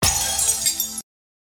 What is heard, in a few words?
Glass Break